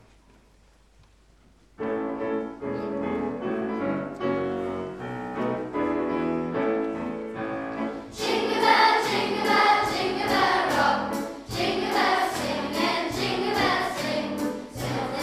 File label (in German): Jugendkammerchor; Ahrensburg; Weihnachtskonzert